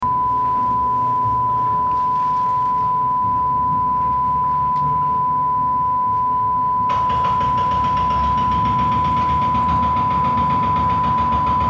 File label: Roadworks